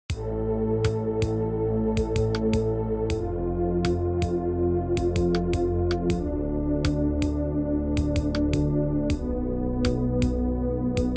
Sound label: synthesizer